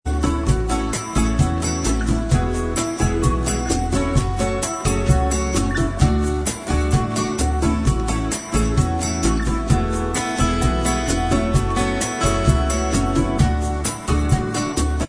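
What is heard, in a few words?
bossa style, Instrumental Track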